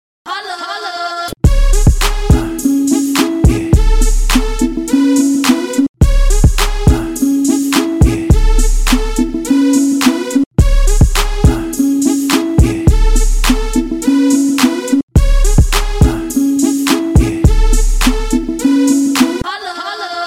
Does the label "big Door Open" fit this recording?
No